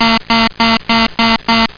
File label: ALERT